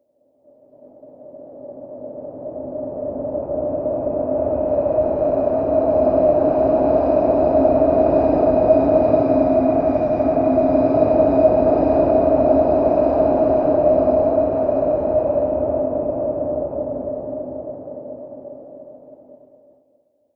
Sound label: wind across plains; flaechen